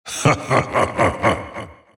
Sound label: laugh; dota; juggernaut